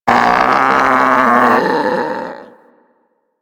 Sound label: Predator Vocal Death; Warframe; Hunting Critters